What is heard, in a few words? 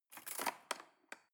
cutlery cake fork drawer scrape